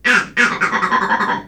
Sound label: Vervet Leopard Alarm